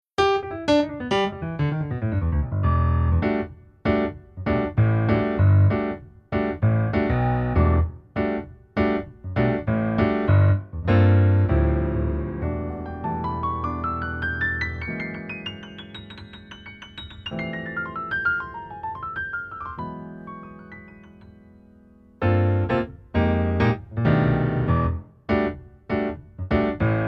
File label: Spectrasonics Keyscape LA Custom C7, virtual piano